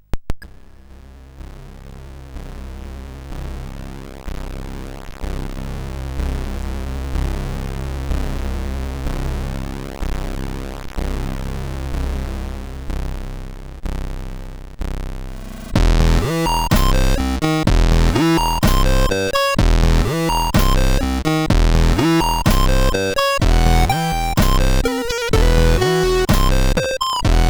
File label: Halloween, CSG8580R5; Halloween 2011